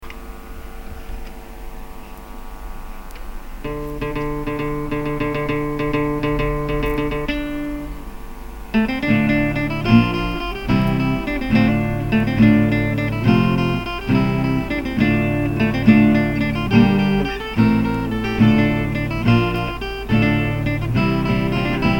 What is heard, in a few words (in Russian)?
Гитара; Саунтрек из кф